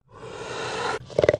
Puma Cougar Hiss Shor, big cats

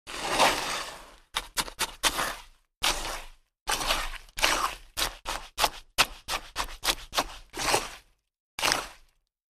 Shovel Wet Mud

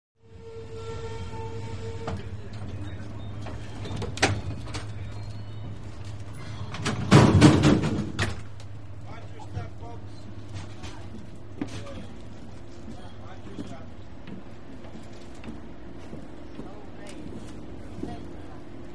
Exit Train To Station, train station

